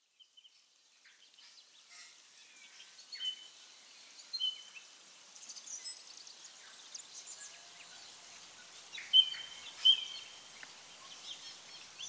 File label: music ambiance